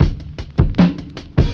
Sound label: booms and baps, boombap drum loops